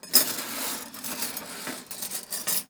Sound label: Silverware Removed from Dishwasher, Kitchen